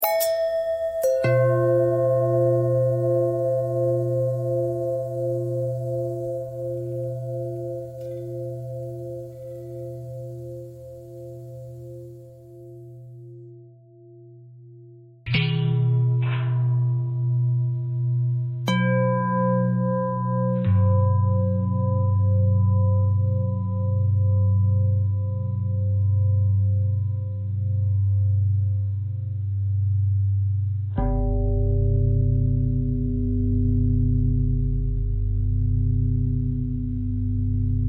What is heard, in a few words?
bell long bell thread simple, bells